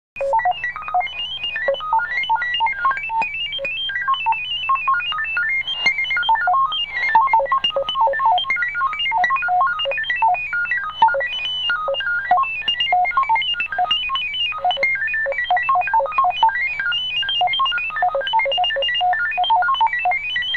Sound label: mfsk16 usb